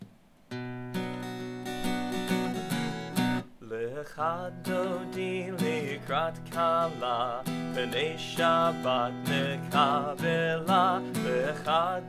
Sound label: Lcha Dodi Trad refrain only